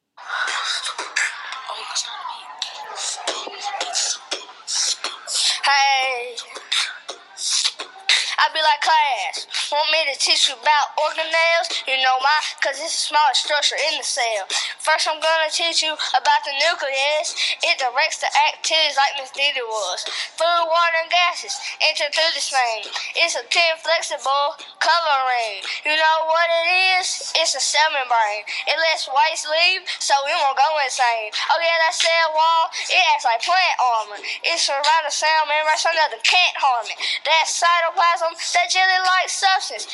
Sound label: cell rap